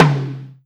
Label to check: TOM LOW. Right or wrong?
Right